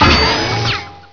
Skid; hover

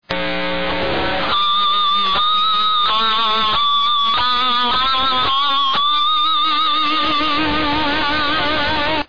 squeels

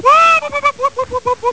whoop

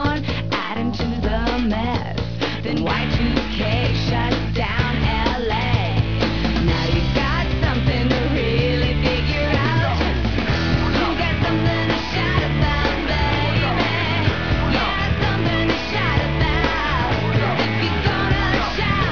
Shout

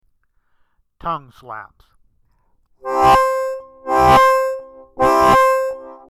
Tongue Slaps

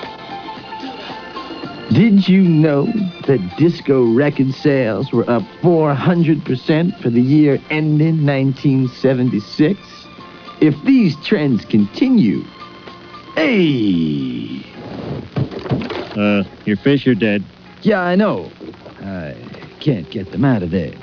disco

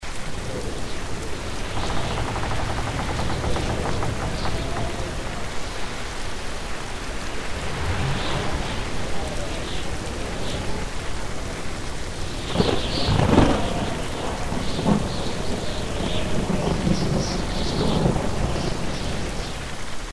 Rain Tree Thunder

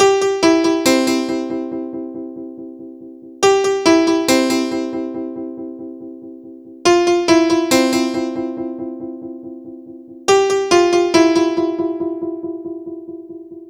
trance piano

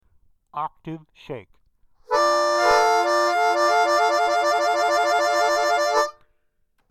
Octave Shake

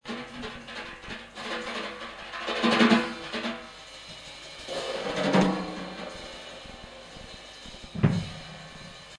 drum

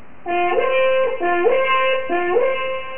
shofar

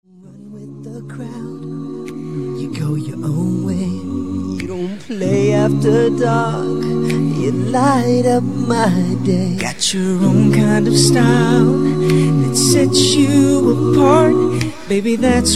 acapella

